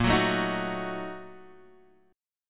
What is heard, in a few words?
Bb-Major 7th Suspended 4th Sharp 5th, Guitar, Half Step Down